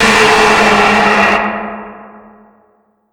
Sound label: Jumpscare; spider eater; toy zombies